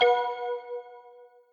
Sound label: exit Sound; BattleBuddies